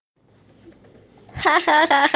risa

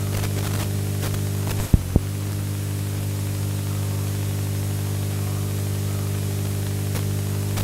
interferencia

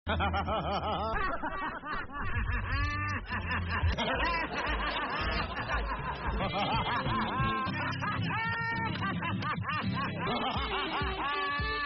la contagiosa risa; pancho saavedra

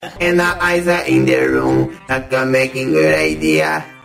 cantando

